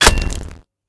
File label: hit; smite; chaac